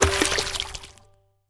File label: Fish Spawn; Candy Crush Soda